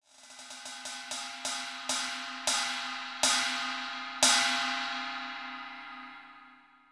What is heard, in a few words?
ride